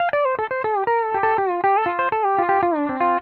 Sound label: PD LOOPS 120, Whole Lotta Country